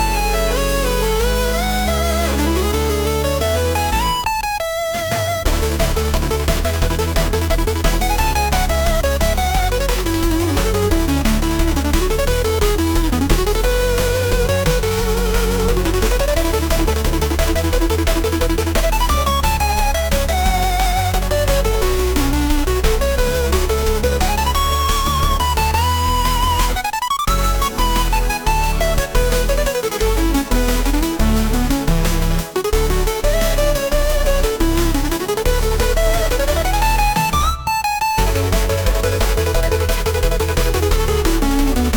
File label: tune, chiptune